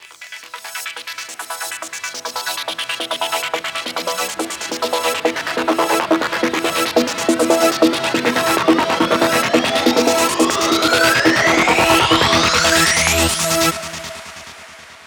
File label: 140BPM Wall E, Melody Fills; 8Bars; Vengeance Samples